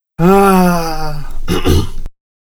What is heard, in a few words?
Ahh